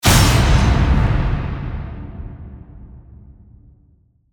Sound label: Hit Epic; Ninja Tracks